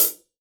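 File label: open hi hat, Real Drum Kit, FUNK KIT; Best Service ProSamples vol.43 - Real Drum Kits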